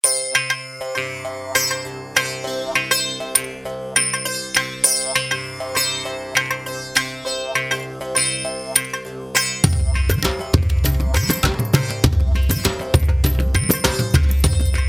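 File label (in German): indischer Beat, mit Tverb; Eventide Tverb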